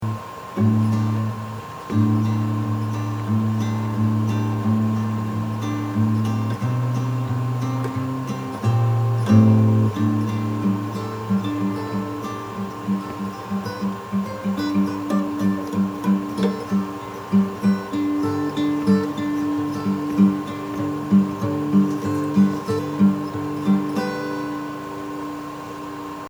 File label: guitar guitar; tonica